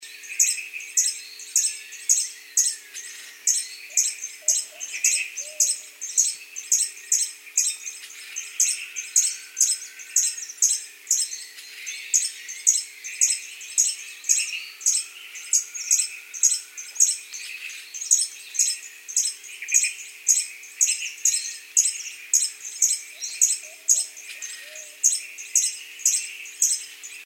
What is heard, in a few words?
plovercrest